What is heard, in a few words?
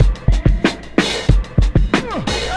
64 Beats